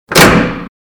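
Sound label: sharp sound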